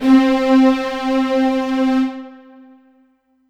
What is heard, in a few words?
Yamaha EX5 Stereo String C4